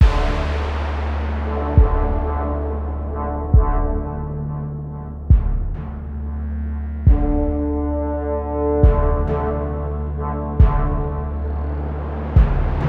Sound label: Sound The Horns; orbital bullet 2022